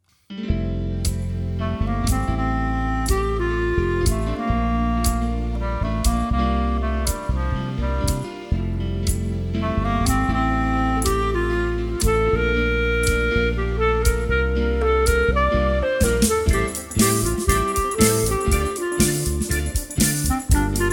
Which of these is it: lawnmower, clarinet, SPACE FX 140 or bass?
clarinet